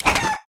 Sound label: coin